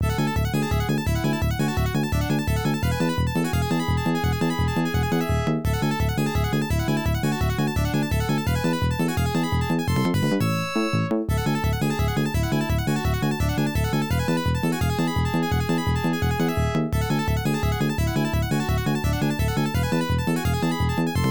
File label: Unused BGM